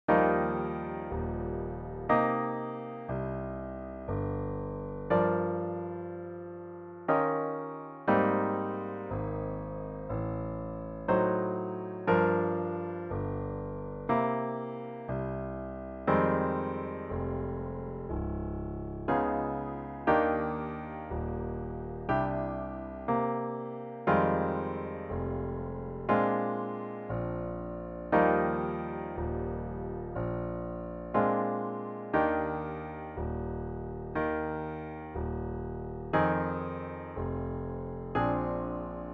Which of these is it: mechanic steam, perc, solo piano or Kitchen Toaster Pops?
solo piano